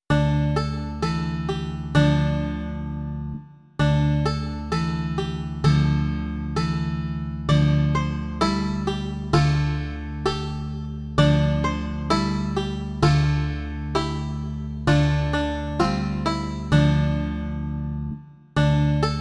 country kapela; Pomoz mi, můj Pane; Imaginativní verze

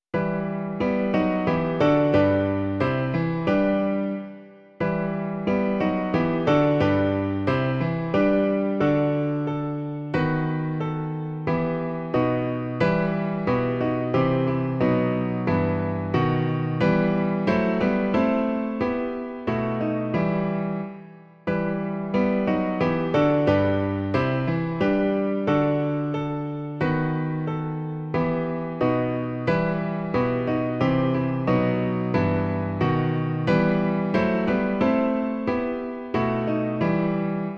klavír, Klavírní verze

